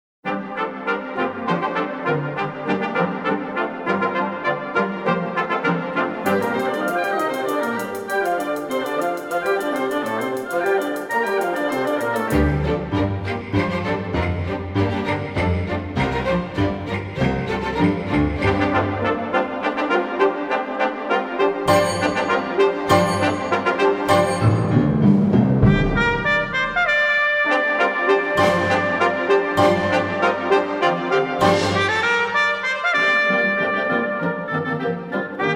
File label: Trumpet Concerto; Ross